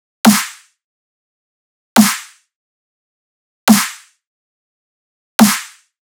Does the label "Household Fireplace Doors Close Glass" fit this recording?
No